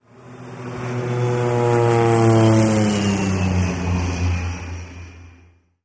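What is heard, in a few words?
Prop Airplane By Short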